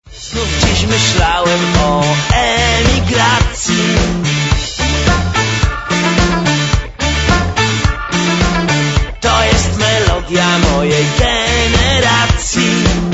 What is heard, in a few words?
jazz